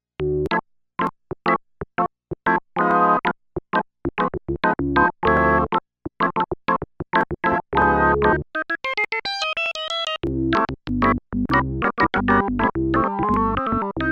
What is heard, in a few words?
Perc Organ